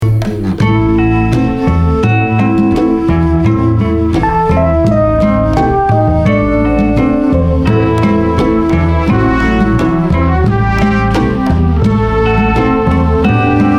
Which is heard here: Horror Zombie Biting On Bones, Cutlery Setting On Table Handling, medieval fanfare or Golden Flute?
Golden Flute